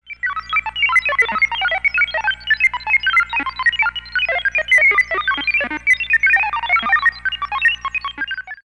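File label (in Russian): военные учения высокотехнологичного радиосигнала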